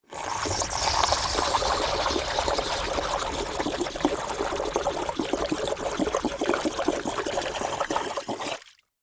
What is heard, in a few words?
Liquid Water Water Balloon Air Release Under Water